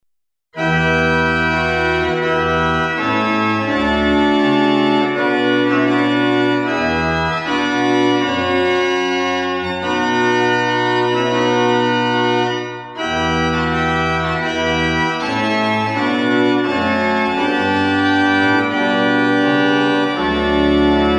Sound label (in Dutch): improvisatie psalm 5; krewerd